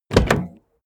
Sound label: car bmw e46 exterior door front open short; Cars